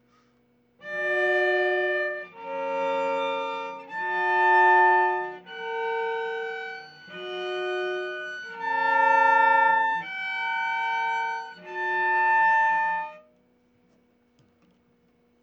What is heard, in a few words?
golden ratio harmony